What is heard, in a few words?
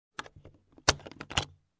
Suitcase open